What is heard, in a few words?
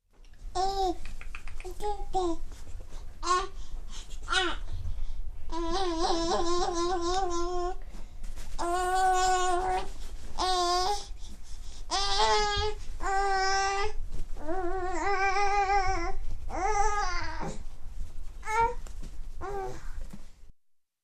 Infant playing around